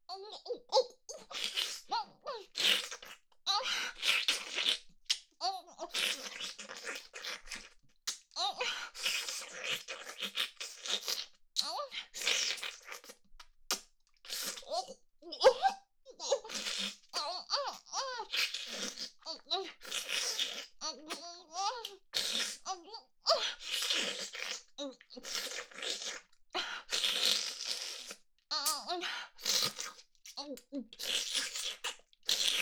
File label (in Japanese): フェラ・激しくフェラをする; 明るい少女系カノジョ